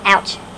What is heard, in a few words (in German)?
OUCH; AUSRUF